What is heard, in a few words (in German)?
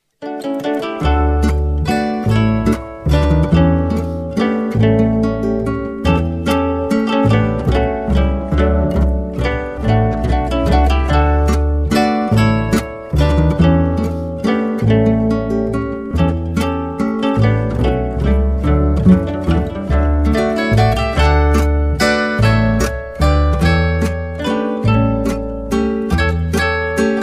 Walzer